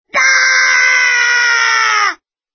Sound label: bwaaaah